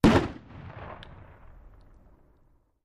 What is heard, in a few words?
Bomb Explosions Sma, rock & sand